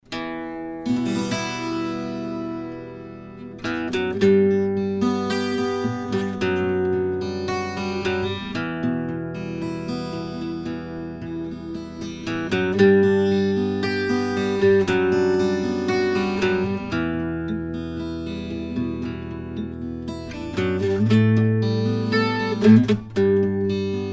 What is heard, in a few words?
back 12string